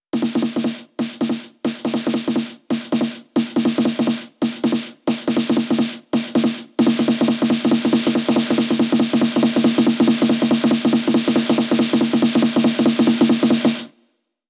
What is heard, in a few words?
140BPM SNARE 8 Bars; Vengeance Samples; Snares&FX Pairs